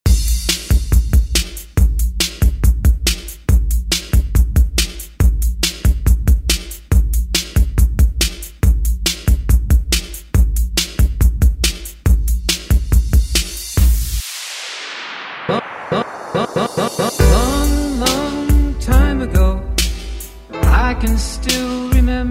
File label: Rock Redrum; Deejay Hit